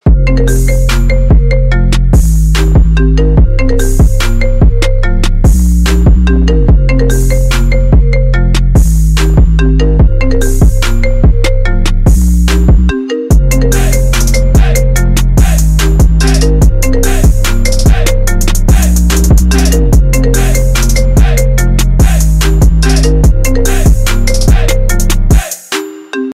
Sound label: Ringtone Mobile